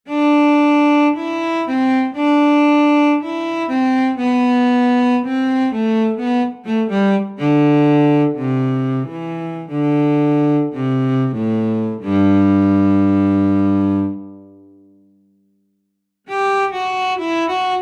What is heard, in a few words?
Single Hole Slider SWAM Cello